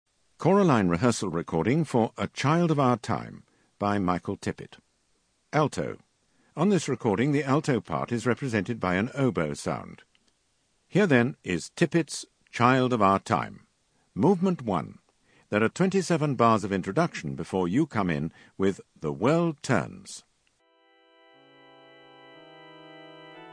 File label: Alto; Tippett A Child